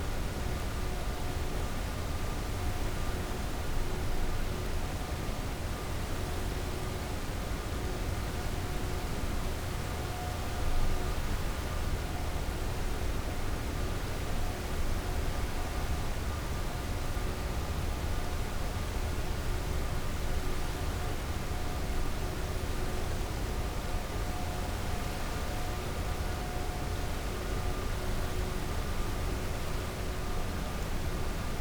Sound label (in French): cachalot negatif, Physeter Macrocephalus